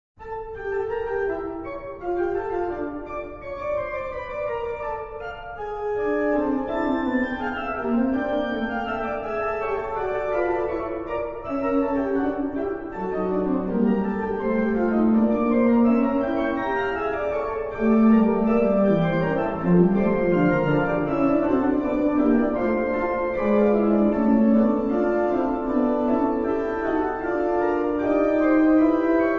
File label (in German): fuge d moll